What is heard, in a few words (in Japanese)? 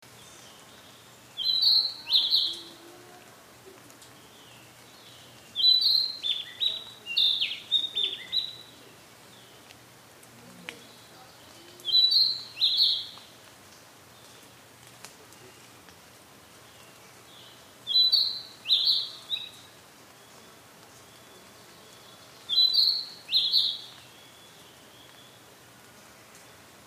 kibita